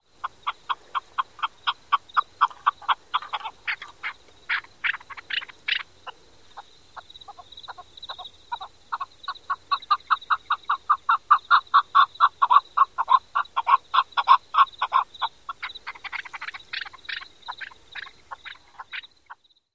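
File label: Chukar song and calls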